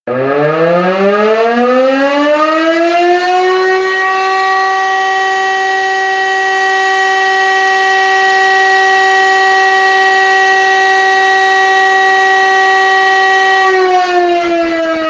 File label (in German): Sirenenprobe